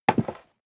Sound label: step leather shoes wood creak single stair up